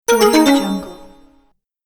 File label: martial art finish